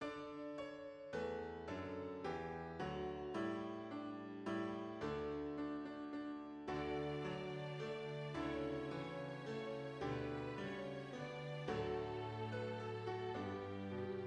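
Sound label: French Folk Song, Strings; Essential Elements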